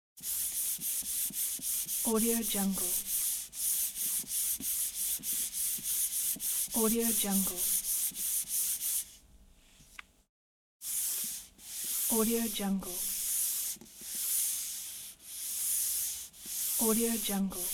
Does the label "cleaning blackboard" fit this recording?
Yes